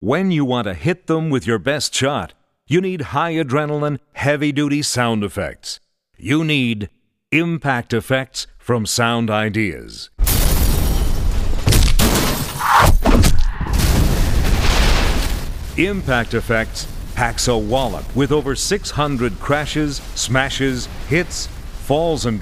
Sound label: impact effects sound effects